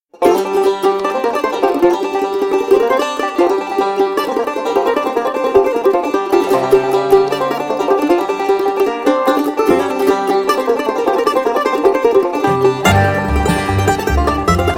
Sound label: mandolin